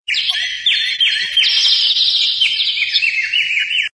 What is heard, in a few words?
Birds